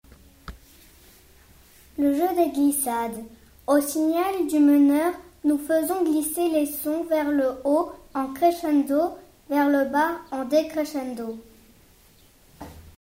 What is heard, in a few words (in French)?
jeu des glissades